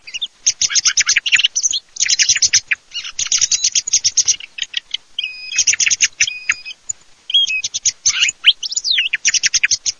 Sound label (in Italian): TORDO MISTO